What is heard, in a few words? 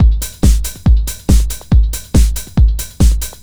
TECHNO BEATS 140